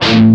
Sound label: Dist Guitar Power A Pm